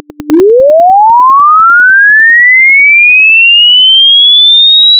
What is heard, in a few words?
chirp transformed